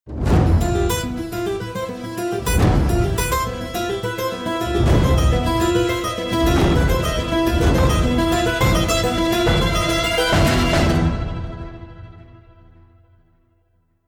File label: round ten sec count